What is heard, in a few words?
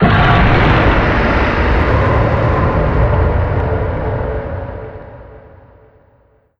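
guard attack, creature; GammaAge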